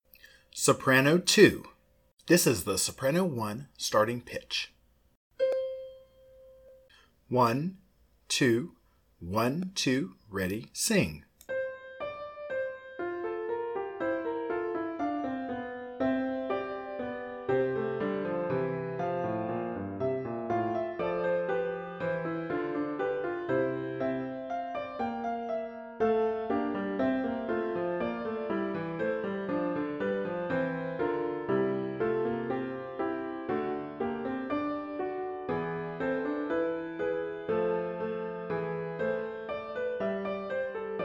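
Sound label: Alleluia Soprano 2 Prompt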